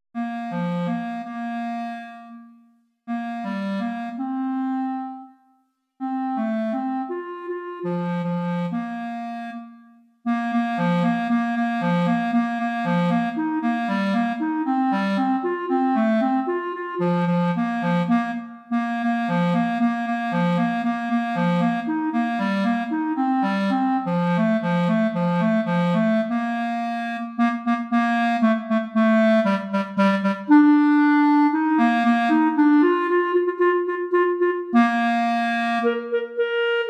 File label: jingle bells the one horse open sleigh